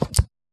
book pickup; Items handling

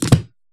book drop; Items handling

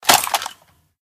pickup, rifle; Items Weapon

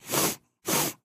snif, Sniffing; Interactions

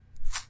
eating; OldThink

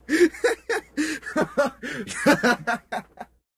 laugh, Voice Human Male

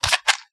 matchbox pickup; Items handling

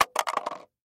tape fall; Jukebox Tapes

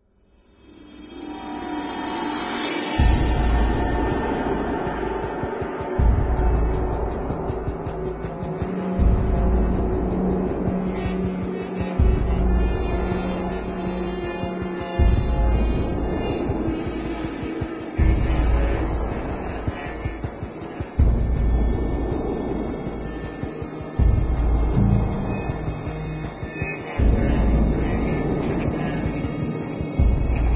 station, Ambience

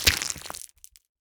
bloodloss runs; OldThink

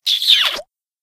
kiss

